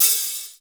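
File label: open hi hat, drum; Crypton - DrumBase5000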